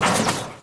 suspen, Vehicle

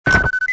sec panel pass; movers

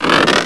Lobster Pot; Finding Nemo; XBOX

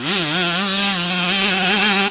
Mid, Engine

